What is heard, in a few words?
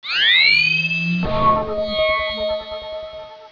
laser